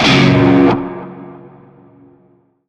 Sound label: Shawzin Yo Chord A; Warframe; Narmer Shawzin